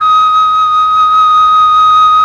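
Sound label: flt d sharp 3, Flute, MELLOTRON; Retro Sampler; Brass + Flute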